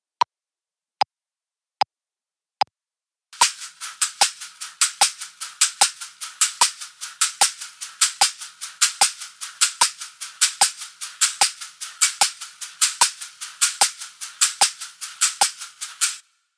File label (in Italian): Con due Caxixi Uguali; shakers